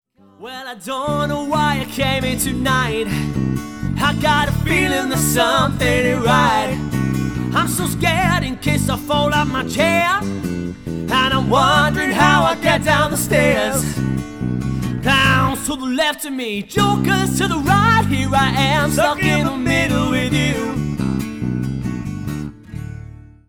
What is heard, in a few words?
Acoustic Duo